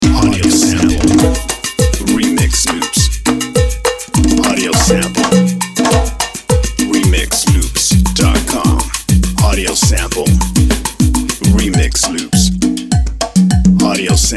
Tropical Loops

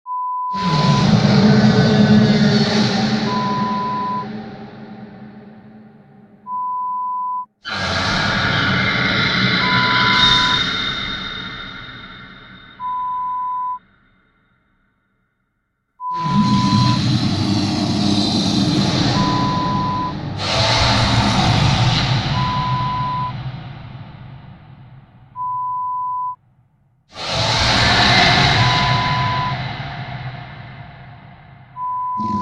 The Beast Horror Sound Effect

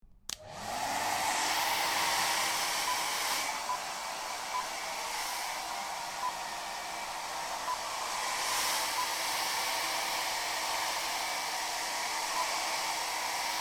Person Drying Their Hair

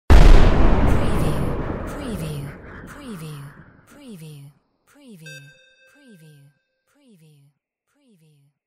WAR CANNON; windy beach audio

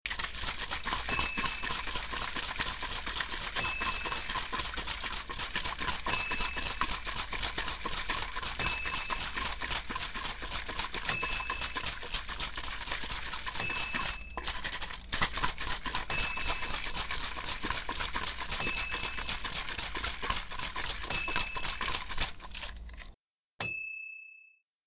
Shaking a Bottle

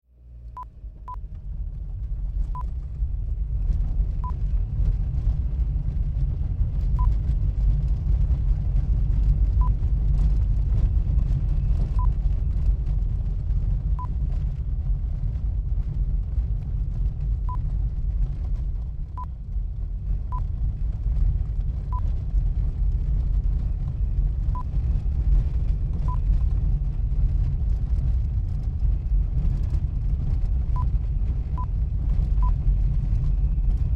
Car interior Cobbled Street

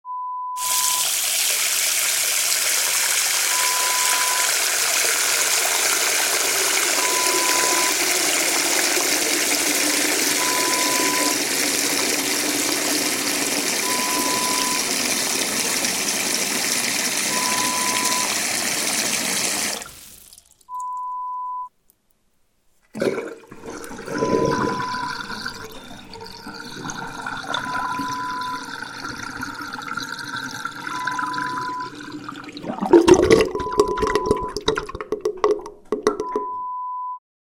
Wash Basin sound

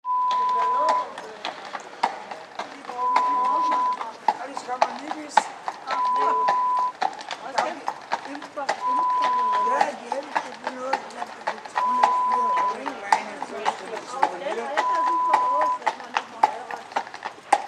Horse Carriage sound

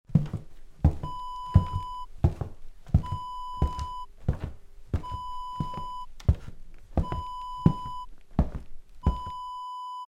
footsteps wooden floor

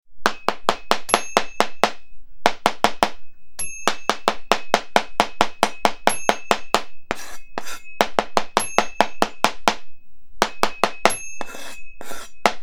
Chef Chopping Vegetables

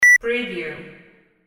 beep

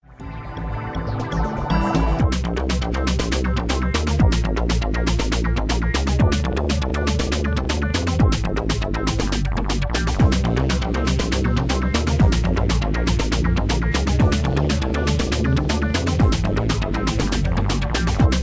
Electro

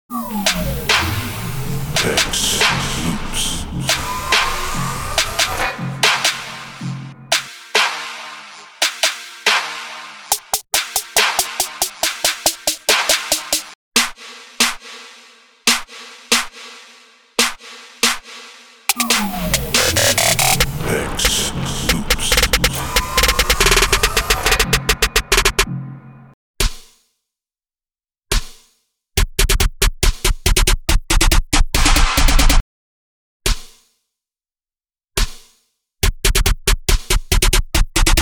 trap essentials snares

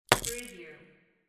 pill bottle to table

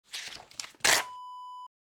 paper rip out

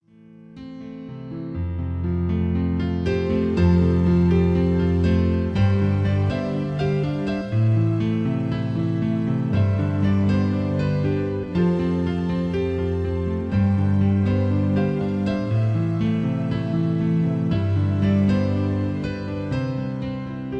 My Piano Version

